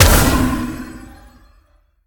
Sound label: impact; smite; olorun